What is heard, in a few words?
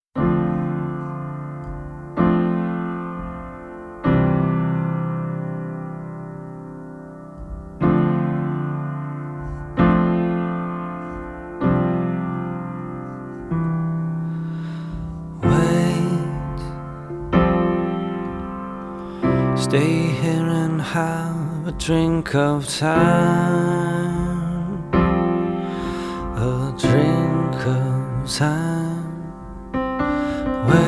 Piano and Strings